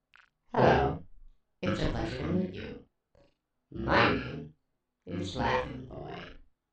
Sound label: Laughing Boy Voice